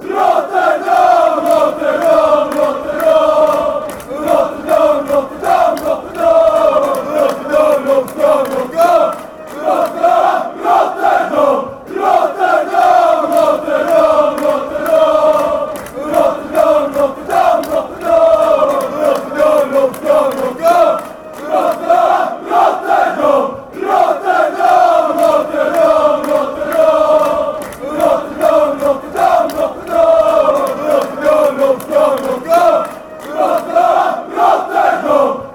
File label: chant, fanchants; feyenoord